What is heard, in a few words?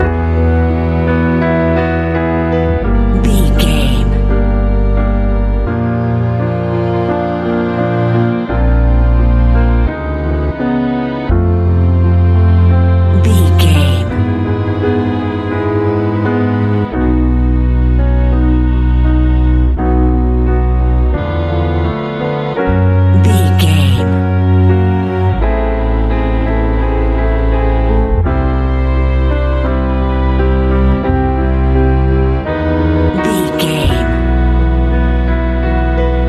happy warm swirl big love classical